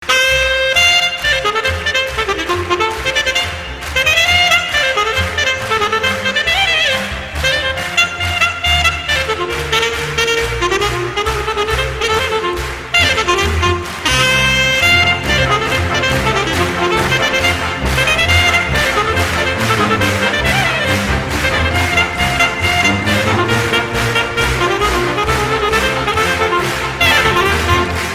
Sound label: Live; klingelton